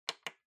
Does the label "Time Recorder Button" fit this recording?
Yes